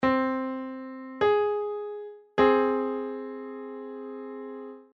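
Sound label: minor 6th, musical intervals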